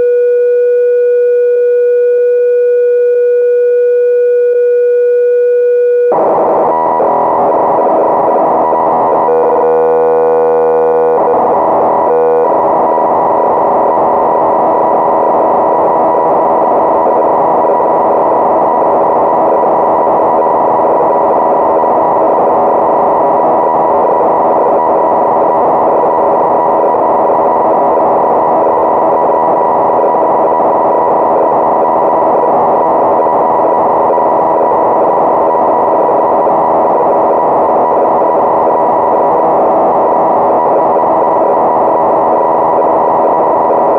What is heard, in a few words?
Crumar Bit One factory presets; Synthesizer Factory Patches; Cassette Interface